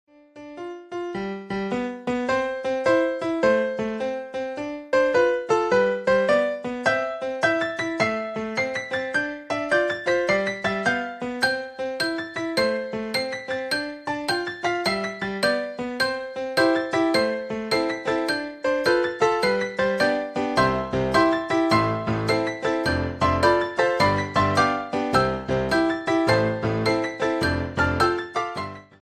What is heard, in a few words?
apple ringtone